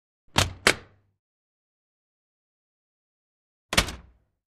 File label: Walkin Freezer Door O